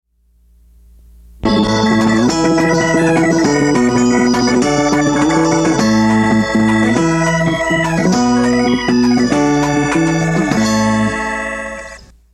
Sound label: fast ditty